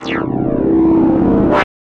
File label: Tactical Rudeness, Bass; Synth